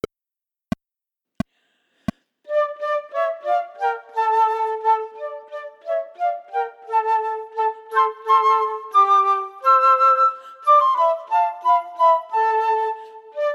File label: Chamber Music for 3 Flutes minus 2nd part; Vecchi; Let Every Heart Be Merry